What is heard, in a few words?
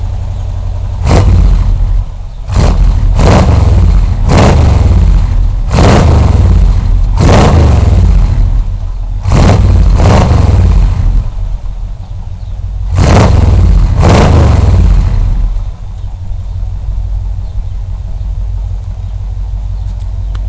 pipes